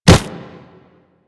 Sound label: Kubrow Run Snow, Footsteps; Warframe